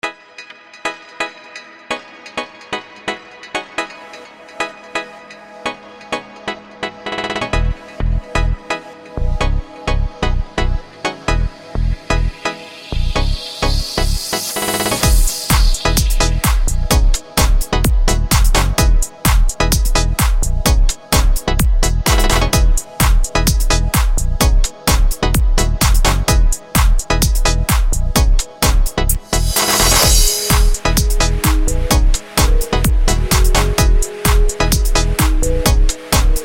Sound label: new beat